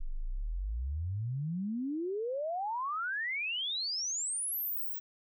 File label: sweep Mono